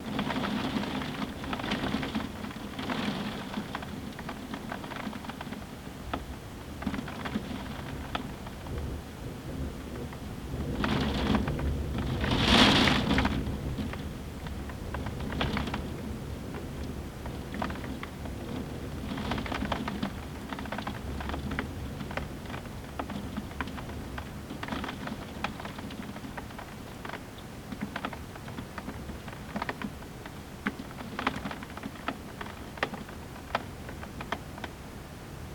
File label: Morning Window Rain